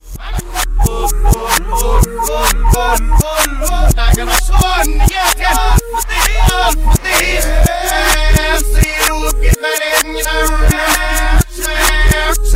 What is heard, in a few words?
song reverse